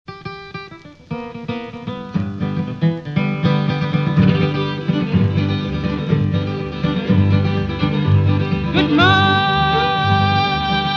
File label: Blues